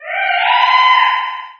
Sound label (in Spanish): Grito de Amaura